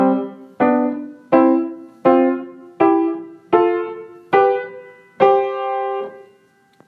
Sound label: piano notes